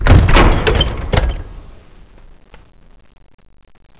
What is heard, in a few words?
door stop